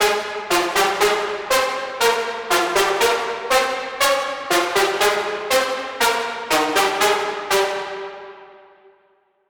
Melody Lead Cinematic; midi wizard

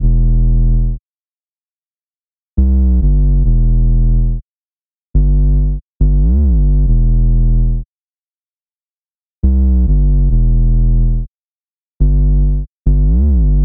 UK Drill UK Grime

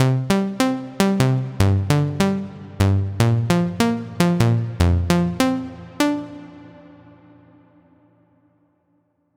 Hybrid Standard Hardstyle Hardcore; midi wizard 2; Unison

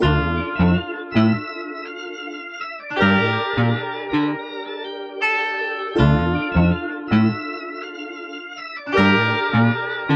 Accent 161 BPM Dm

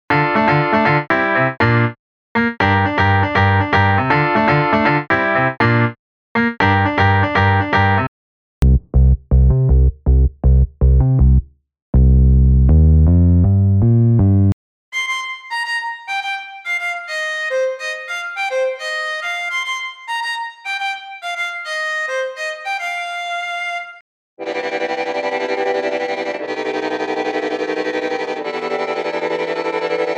Gospel; unisynth

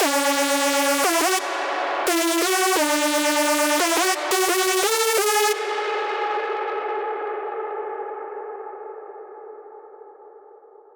Melody Lead Drum Bass; midi wizard 2; Unison

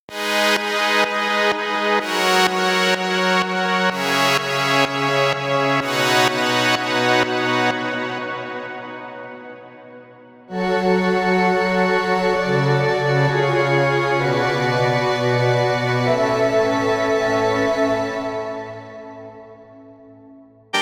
Chords Techno; Techno & Melodic Techno; Chord Progression Demo; Unison MIDI Wizard Plug-In

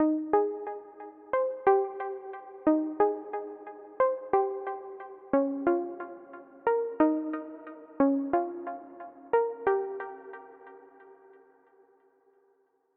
Melody Pluck Neo Soul; midi wizard 2; Unison

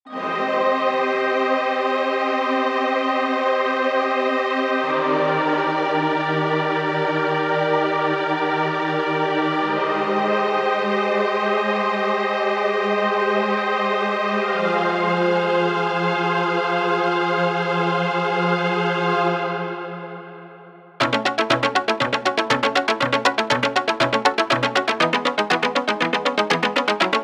Cinematic Orchestral

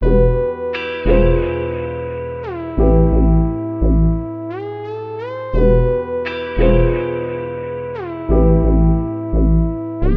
174 BPM Em; Lexus

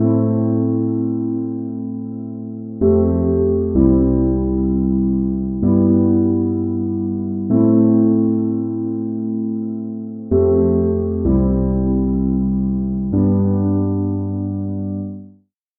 A Minor Prog

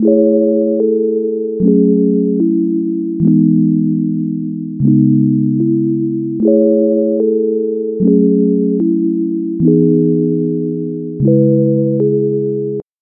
E 150bpm; Sweetheart

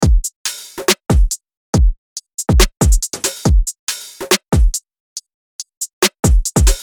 Future Bass Melodic Trap 140BPM

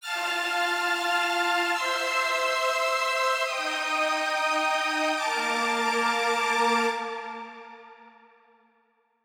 Chords Full Folk Indie; midi wizard

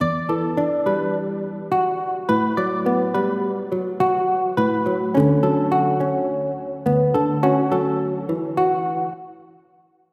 Hybrid Advanced Folk Indie; midi wizard

